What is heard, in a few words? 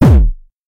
Se Attack